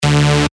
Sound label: Synth Shot D; synth shots tech house; Lucario; revealed